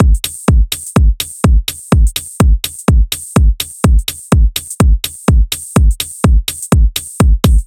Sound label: Fridge C; Сэмпл ударных (Минимал-Электро); Minimal Electro; 125 BPM; Beat; Drums; loop; Ударные